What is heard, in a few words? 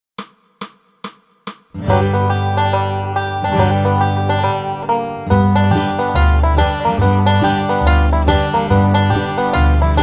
Banjo 140; Carroll County Blues